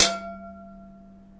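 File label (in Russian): металл звонкий близкий яркий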